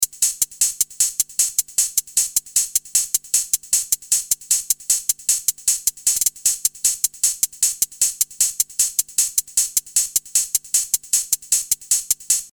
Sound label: 808, Hats; Industrial